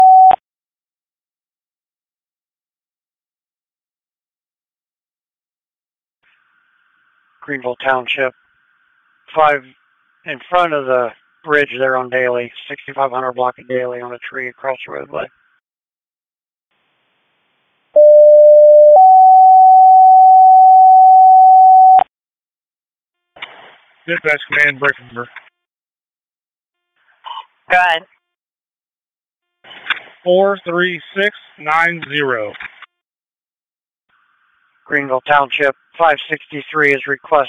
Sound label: Township Station, Tone Alerts; Darke Greenville Station 5; Saint Marys